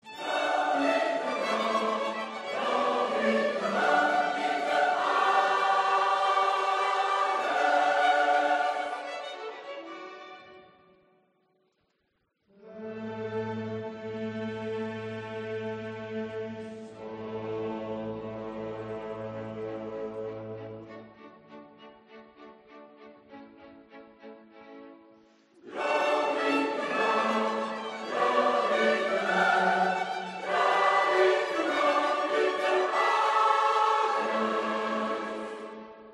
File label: CHORUS Glory to God in the highest; Messie Handel